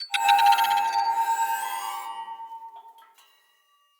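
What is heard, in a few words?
Cave Crystal Magic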